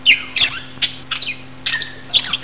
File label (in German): Vogel